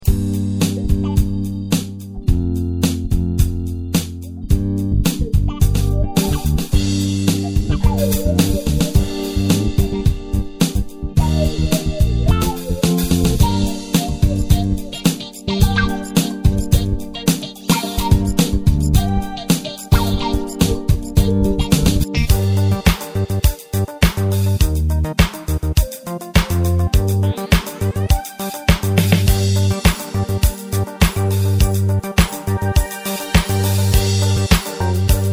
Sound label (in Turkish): bk-7, roland bk-7m ritim modul